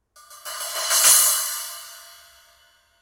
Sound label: 10in Trashformer, cymbal; ZXT